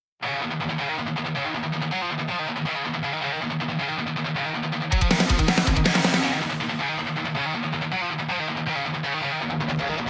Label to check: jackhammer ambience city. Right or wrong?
Wrong